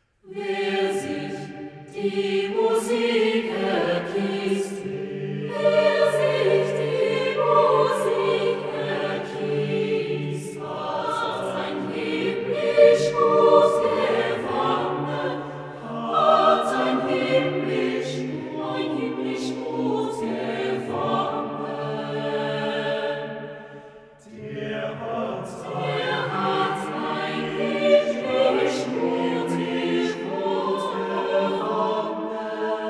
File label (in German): SATB; Lyrics: Martin Luther; Zeitgenössische Chorwerke